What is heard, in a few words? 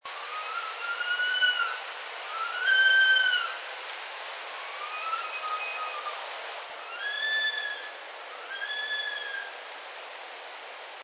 saw-whet owl scream